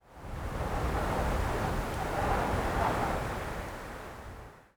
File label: wind gust; Counter Strike Model Pack; CS:GO